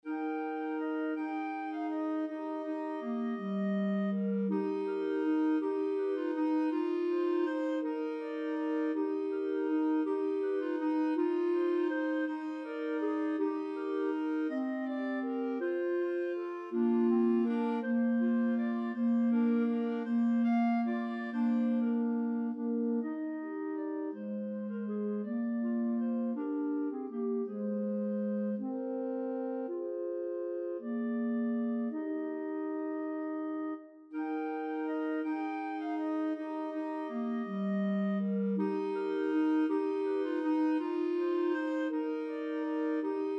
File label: clarinet trio